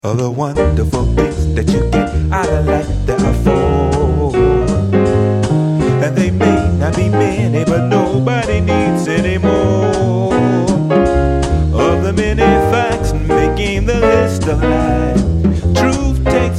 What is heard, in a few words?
Four swing